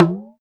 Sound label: Perc, Alesis DM5